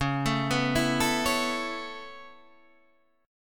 Db Minor 7th Sharp 5th Db down Guitar Standard; arpeggiated; JGuitar

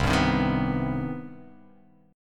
C Minor Major 7th C; strummed; JGuitar

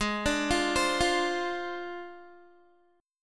G sharp 6th Flat 5th G sharp Guitar; arpeggiated; JGuitar

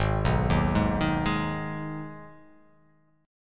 G sharp Minor G sharp down, chord

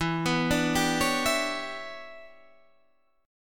E Minor 13th guitar chord, Half Step Down

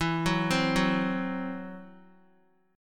E Suspended 2nd Sharp 5th, Guitar, Esus2#5 chord; arpeggiated; x,7,6,5,6,x

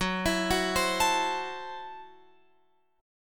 F sharp Minor 7th Flat 5th, Guitar Open F; arpeggiated; x,13,16,13,15,16

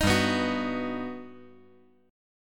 G Augmented Major 7th

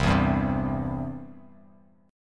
C Major; strummed; JGuitar

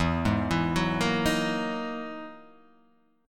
E-Major Sharp 11th E, Guitar Half Step Down

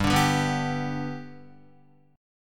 G Major G down Guitar 4F 4A 5C 5G 5C 6E; G strummed; JGuitar

